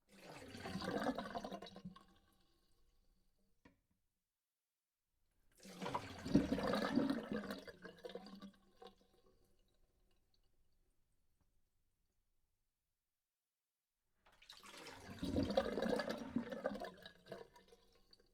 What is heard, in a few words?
kitchen sink drain close